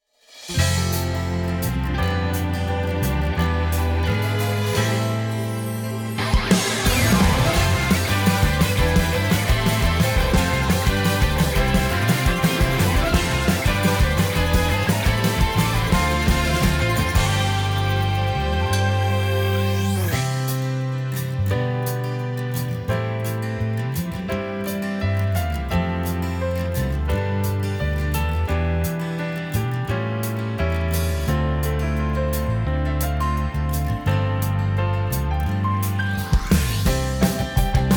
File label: inst